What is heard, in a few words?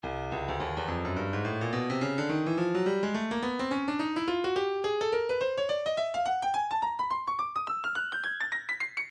breakbeat midi 106 bpm; edifice c; Stylus Breakbeat Midi Files